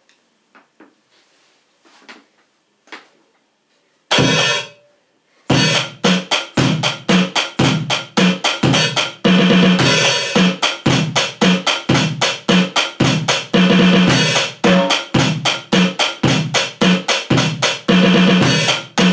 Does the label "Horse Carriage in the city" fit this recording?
No